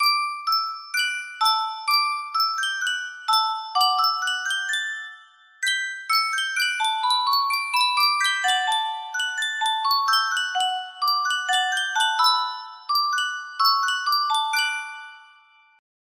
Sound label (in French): yunsheng boite a musique